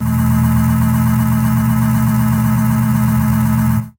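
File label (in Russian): elektrodvigateli rovnoe zhuzhzhanie, lunohod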